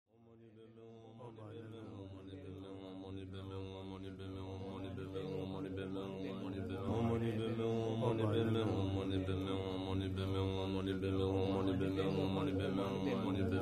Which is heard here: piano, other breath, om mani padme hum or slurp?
om mani padme hum